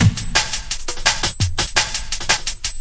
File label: 170 tropical supersharp, drum loops and breaks